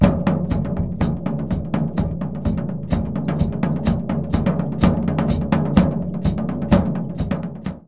haw drum

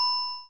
coin

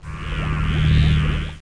tube

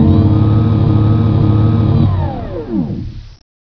Lift noise; environ; Gunlok

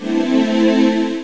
key; Museum of Anything Goes

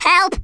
grenade; worms2

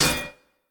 shield; kult

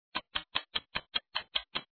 Ticking; super fishing

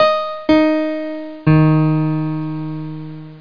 Piano Lo; Percussion

